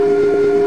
Motor, Train; R110A

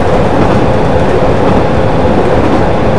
Run, Train; OpenBVE

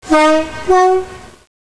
klaxon, Train; OpenBVE; Cl313 Silverlink

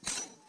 wiper valve, Train; OpenBVE; 55022 Royal Scots Grey

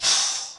Air High, Train; OpenBVE; EU07-112

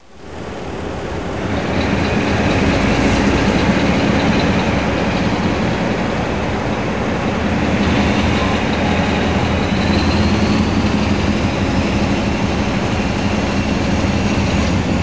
runup, CLASS 47, Train; OpenBVE

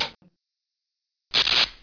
sparks, Train; EN57-815; OpenBVE

